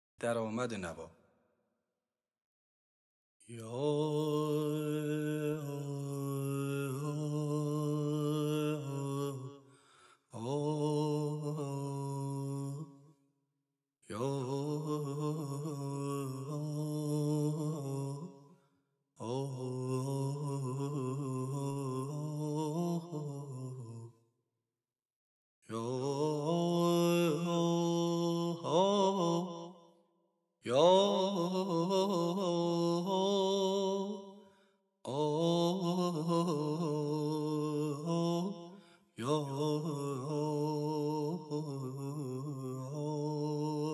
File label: Daramad Nava Radif-e Karimi; Dastgâh Nava